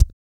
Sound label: Vocal, Blues & Soul; ILIO; Vocal Planet VOL-2